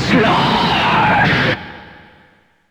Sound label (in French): JINGLE